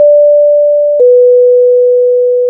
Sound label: Airplane Chime